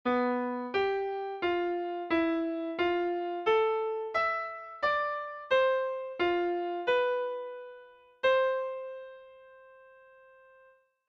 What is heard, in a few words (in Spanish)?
Melodía